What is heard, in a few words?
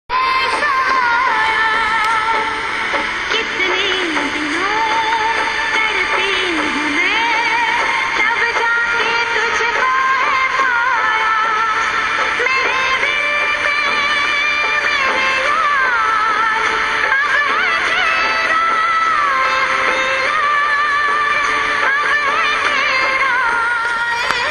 indian music